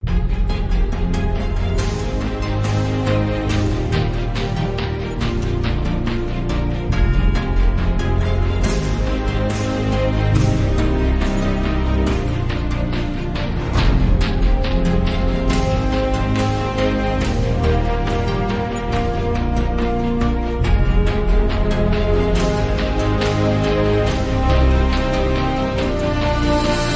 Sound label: No Choir